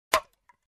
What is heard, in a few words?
Empty Can ding sound